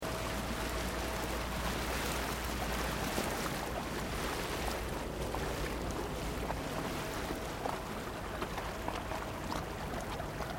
Boat Water Taxi Static Waves Only, Small water taxi